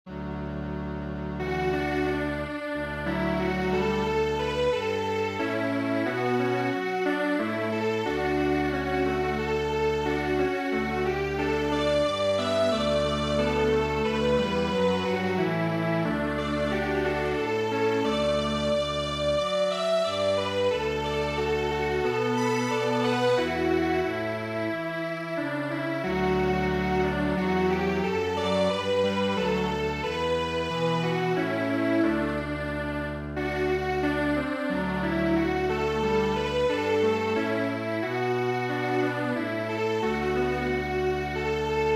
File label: Strings Quartet; hazy moon